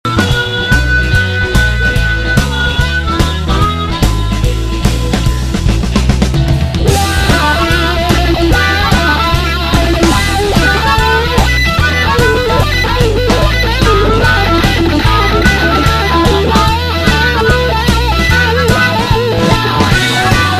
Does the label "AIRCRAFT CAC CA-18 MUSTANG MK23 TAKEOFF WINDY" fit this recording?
No